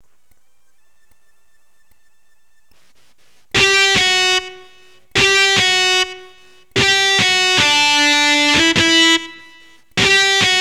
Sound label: e gTR Line Recording